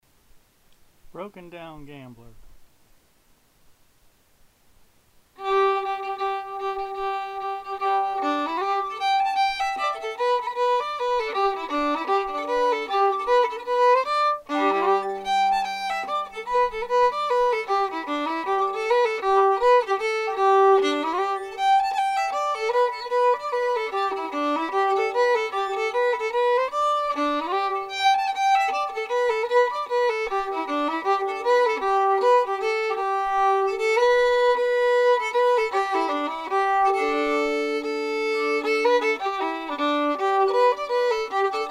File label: fiddle; festival tunes